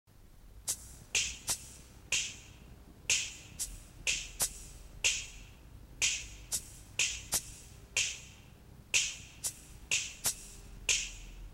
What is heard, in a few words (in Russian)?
zvuki saksofona